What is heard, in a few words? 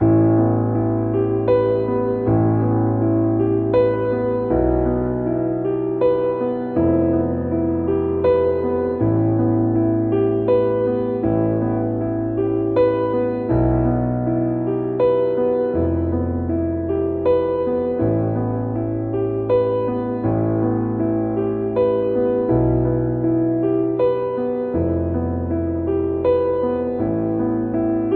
Ostinato Cinematic 6 8 A Minor 80 bpm, Grand Piano; MIDI Clips; Ableton